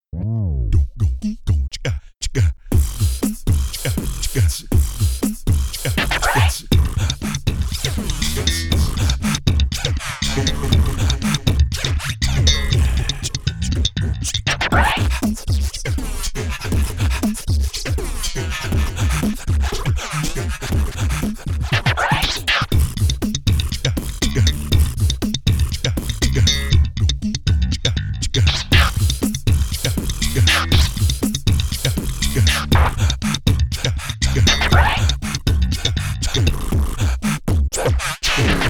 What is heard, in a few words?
Beatbox Chica Chica